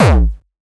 kick

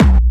Medium, kicks

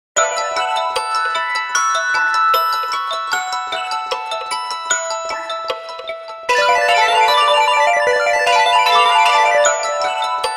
Japanese clock alert; Nokia Ringtones; Audio themes

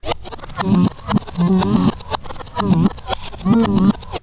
Vinyl; Sound FX

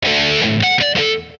Punk email alert; Nokia Ringtones; Audio themes

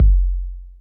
kicks, Bass Drum

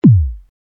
kick

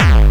bombo, kicks, Hardcore

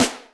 snaredrum, Yamaha RM 50

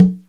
Bongo, Yamaha TG-33; Drum Samples

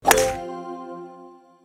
Golf email alert; Nokia Ringtones; Audio themes

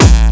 Bombo Hardcore, kicks

